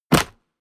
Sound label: mechanical switch wood medium push; industrie-wirtschaft; mechanik